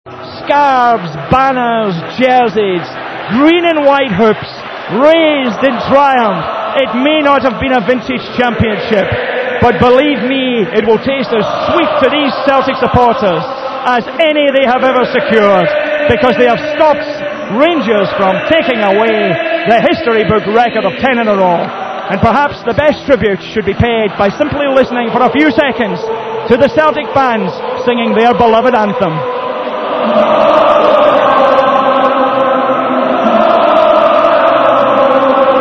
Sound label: glasgow celtic fans live walk on cheerio; walk on cheerio 10 in a row